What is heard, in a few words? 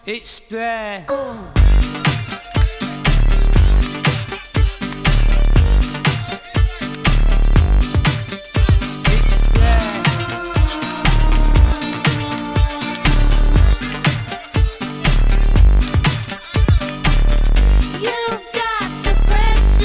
Audio-Technica AT-LP2D-USB Turntable